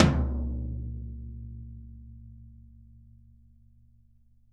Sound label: VINT KITS; Sonic Reality - Vintage Timetraveler